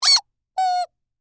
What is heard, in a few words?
Squeaky Toy Slow, Single; Foley; Miscellaneous Toy; BlastWave